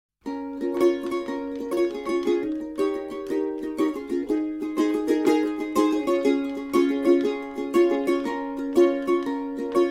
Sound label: flanger